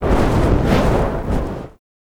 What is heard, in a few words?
Fire Engulf, Exterior; Warframe; Awakening Remaster; Ambience